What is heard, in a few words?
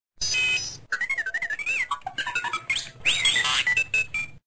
Sms Robot